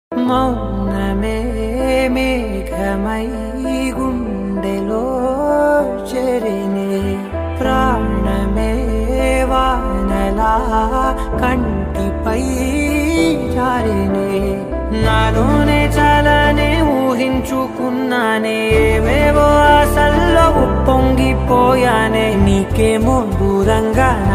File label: song ringtone, telugu ringtone